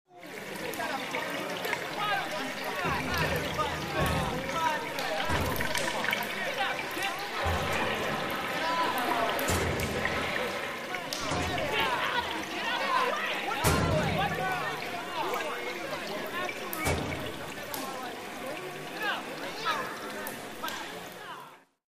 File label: Locker Room Ambience, showers, gym; Locker Room Ambience, Male Teen Walla, Shower Drips And Locker Bangs.